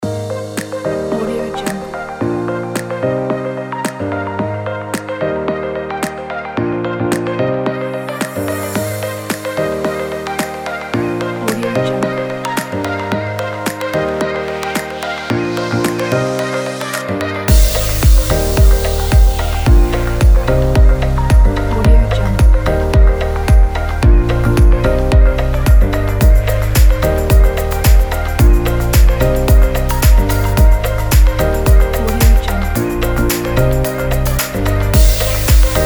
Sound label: tropical travel